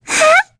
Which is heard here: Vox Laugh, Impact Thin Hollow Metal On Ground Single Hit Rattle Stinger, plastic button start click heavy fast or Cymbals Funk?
Vox Laugh